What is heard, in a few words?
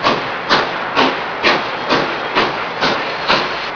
steam